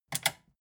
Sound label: metal button click power off fast